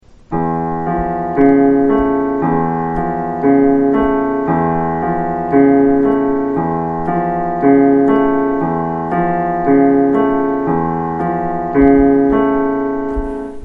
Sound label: Ostinato; Focus